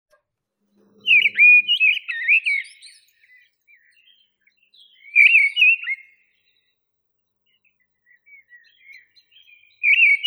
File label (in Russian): drozd deryaba turdus viscivorus